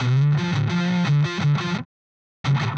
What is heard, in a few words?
Scooped Mids, Guitars; Rock